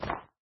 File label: snow, step